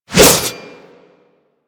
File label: Light Glaive Whoosh; Warframe